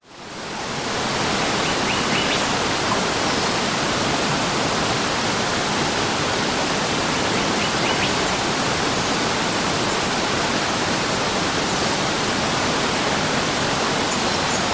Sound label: nature creek fast flowing rainforest light birds bg; zapsplat